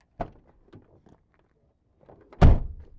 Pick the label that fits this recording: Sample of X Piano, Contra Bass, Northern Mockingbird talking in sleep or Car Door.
Car Door